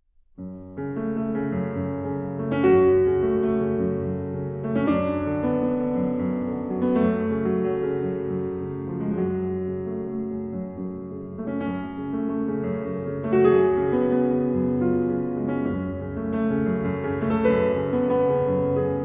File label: piano sonata, un poco adagio, allegro vivace; nr 1 in f-sharp minor op11